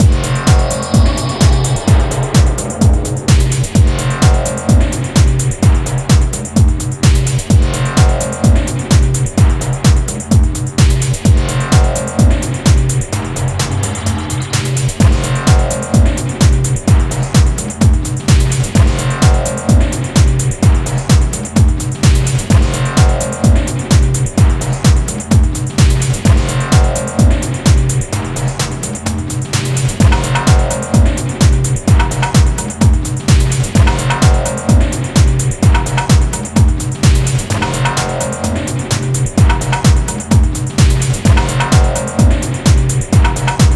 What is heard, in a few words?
Drowzy Techno